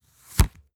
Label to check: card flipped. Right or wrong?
Right